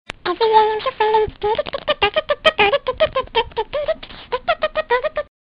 Shuffling

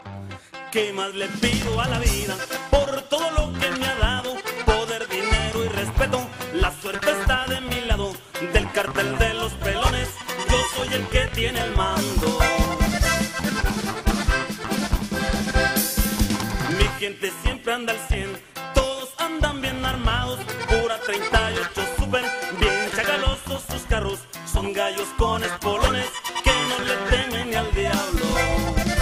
Norteno; Pleito

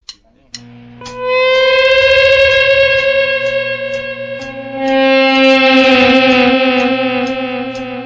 Horror Horn

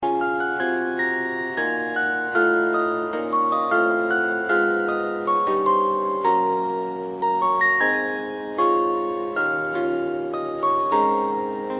Anycall Orgel

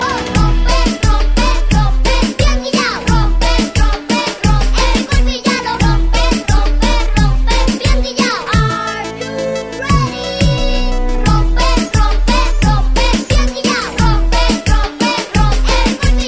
Child Song

